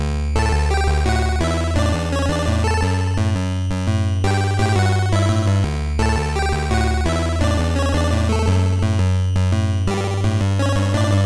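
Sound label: happy feelings; mrrescue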